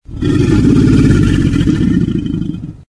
crocs growl; Source Engine; tf; lair

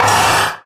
metal frame scrape; Source Engine; Portal 2

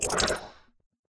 editor other explo, ui; Source Engine; Portal 2

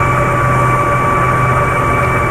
turbine, ambient; Source Engine; tf

